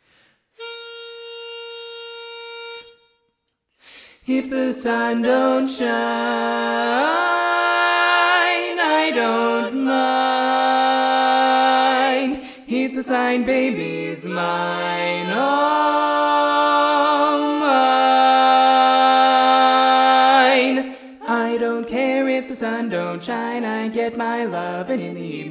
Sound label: bass dom